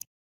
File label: running water, river sounds